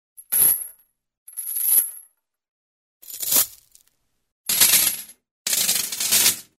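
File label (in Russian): цепь падает на бетон землю металл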